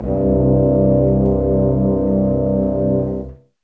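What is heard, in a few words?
60 dark, orchestra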